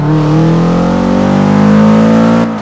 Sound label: second, vehicles; gmod; tdmcars; sub wrx sti